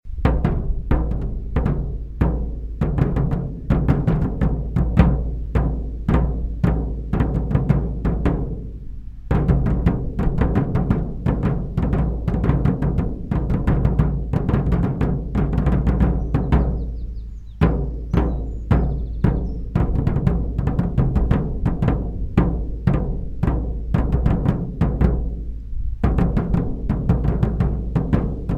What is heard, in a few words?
Drums Civil War March Quick; ultimate general gettysburg gamerip 2014